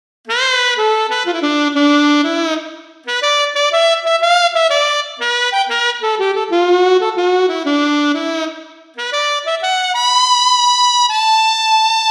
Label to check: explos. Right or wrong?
Wrong